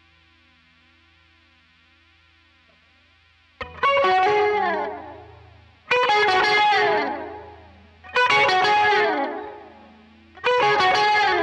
quilter solo

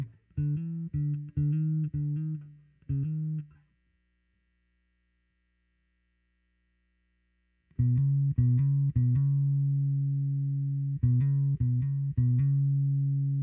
new Bass

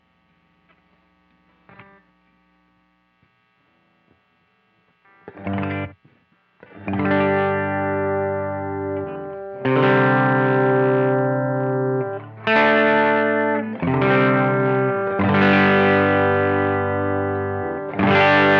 LP hold

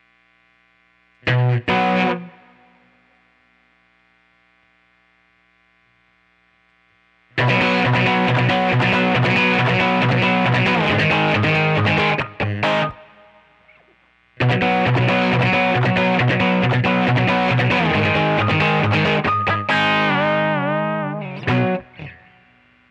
quilter norm 61

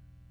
bass takes

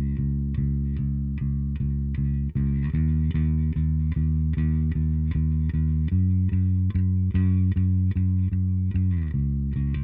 new Bass

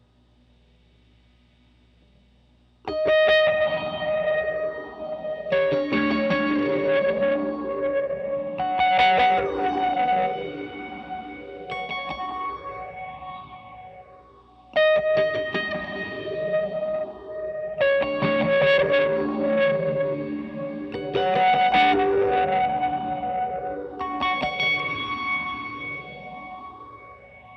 squire2

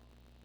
Twin

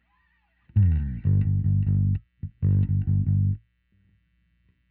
New Bass

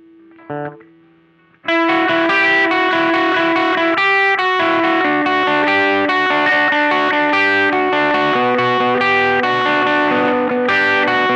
Riff Slow

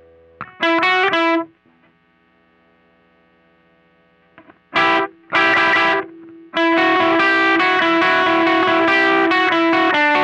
Riff Slow

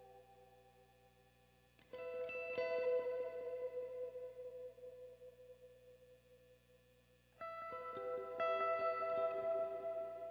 strat r121

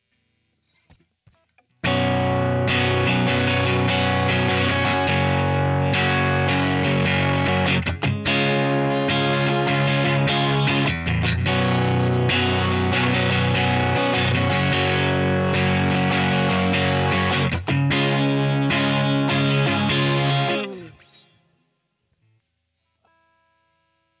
quilter squire

